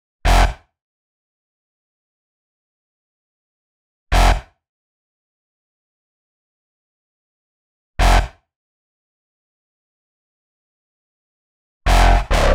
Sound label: 124 G Bass, Complex Electro Synths; Prime Loops; Elements